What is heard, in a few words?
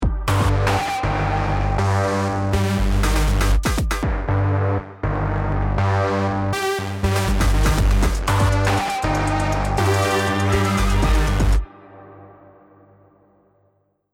start action, music